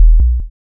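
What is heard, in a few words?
waveform FM sine triangle envelope silence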